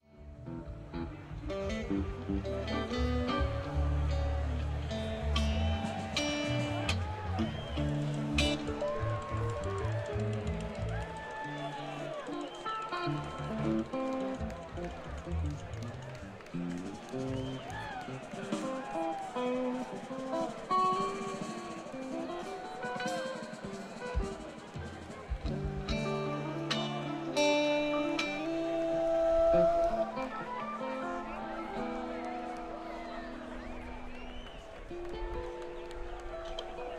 Tuning; averlux smk-h9k

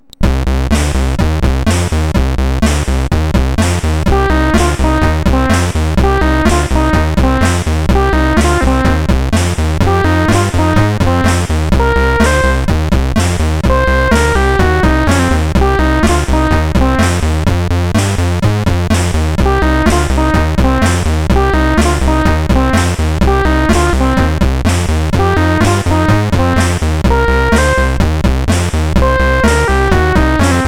Workshop Blues

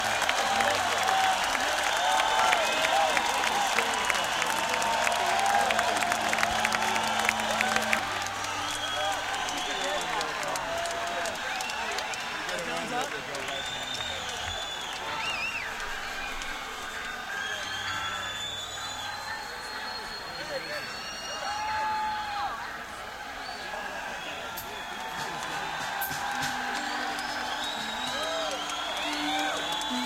Encore Break; senn421

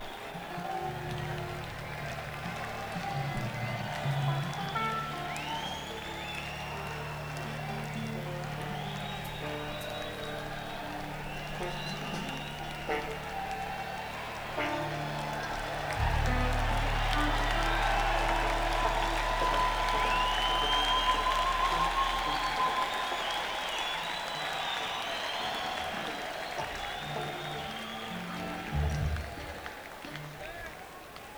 Crowd Tuning

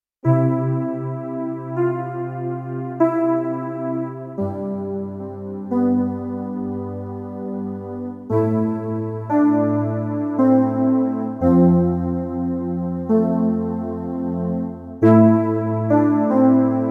Fatter Pad; Kawai K1; Bank 2 card A

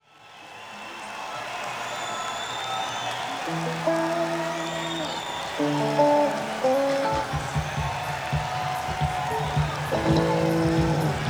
Crowd Tuning; senn me80

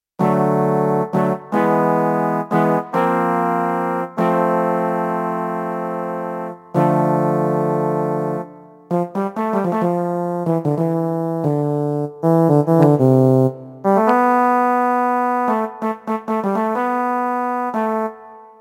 Mariachi; Bank 2 card A

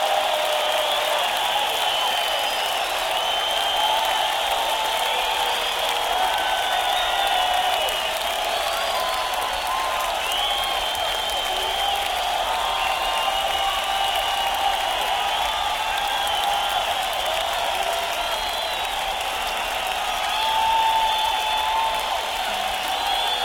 2nd Encore Break

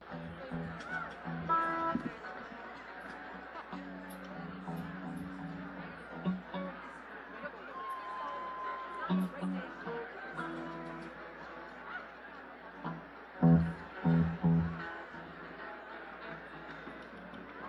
Crowd

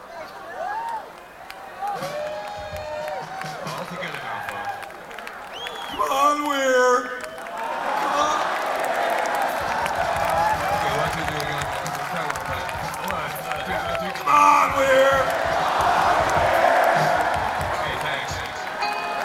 Banter Crowd Tuning

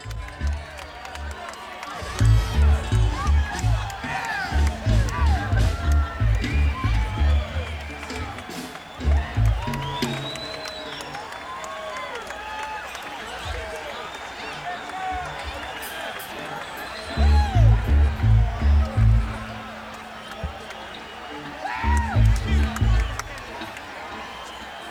Crowd Tuning; senn me80

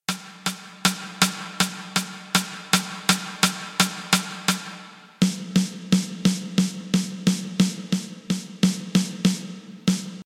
Rim Snare, Kawai K1

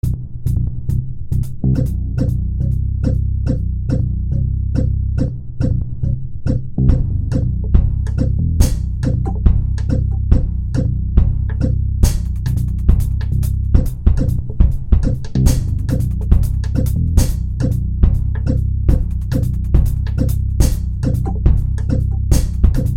Rythmic

